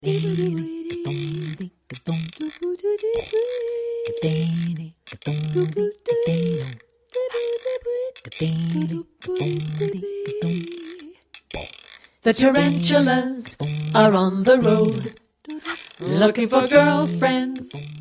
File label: natural rhythms tarantulas